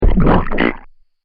Big Muddy Splat, slimy

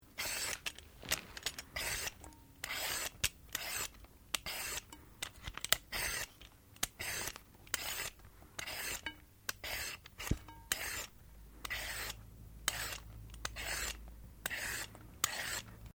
Carrot peel; Food preparation; Restaurants

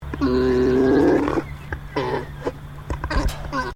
Animal Alpaca Male Mating Call; Alpacas on a farm in Gippsland Victoria; Miscellaneous Animal

